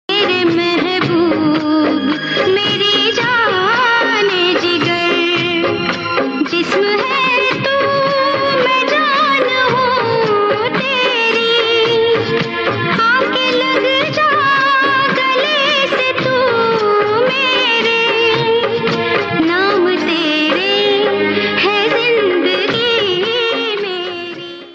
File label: Old Song Ringtone